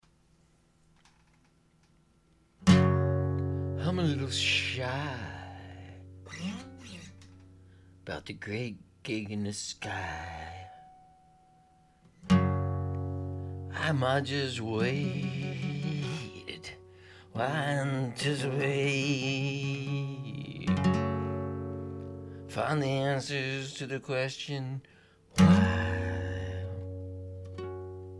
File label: Interlude